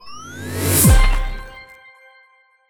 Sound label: Level Up